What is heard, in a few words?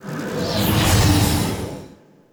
Portal Touch; Exterminate; Warframe; Zariman